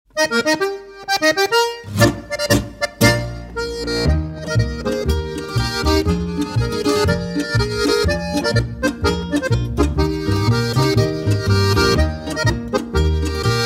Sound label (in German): marsch